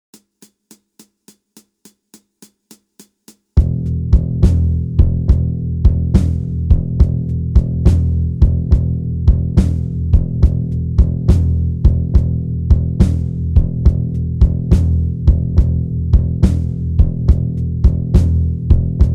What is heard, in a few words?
slide bt